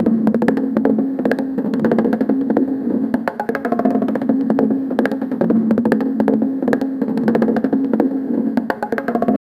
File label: ball rolling on wooden ro